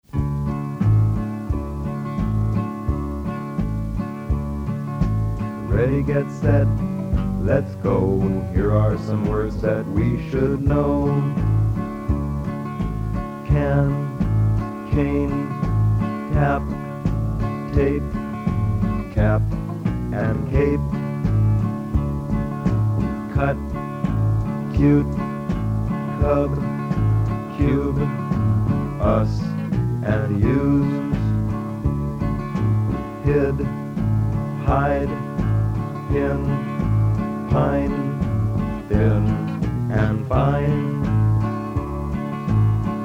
Song 6 - Ready, Get Set (Short, Long Vowel Contrasts)